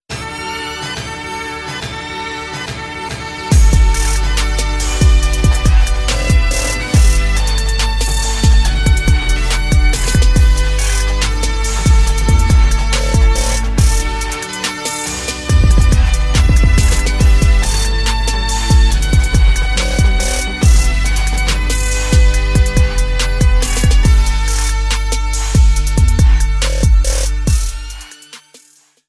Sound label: trap remix ringtone; outlander